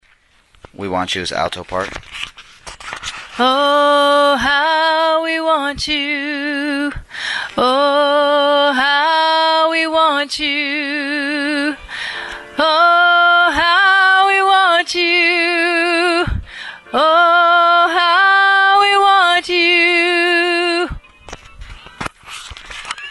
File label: alto